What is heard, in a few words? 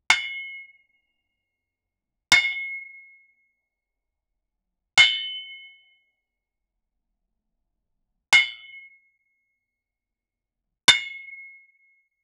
anvil